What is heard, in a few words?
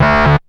HIGH GAIN; SoundFactory; SMPMANIA; EFFECTS